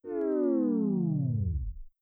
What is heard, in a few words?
fail sound; Aireu (megahello x Min2.0); Yellow